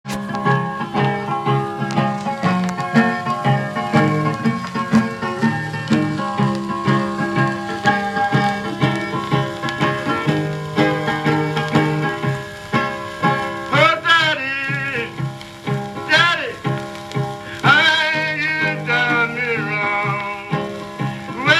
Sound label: Blues